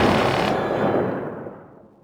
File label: mgsj fire no ammo npc far